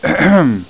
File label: ahem